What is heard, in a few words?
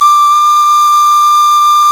pan flute; Sound & Vision - Gigapack I CD 2 (Roland); Brass&Woods2